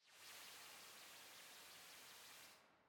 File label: ENERGY SFX Fill; Cave SFX